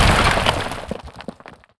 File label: Big Mud Man Die